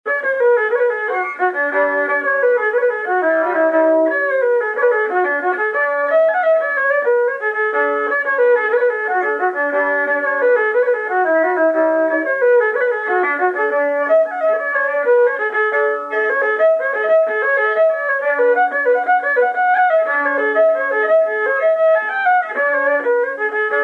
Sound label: jig; Gillians Apples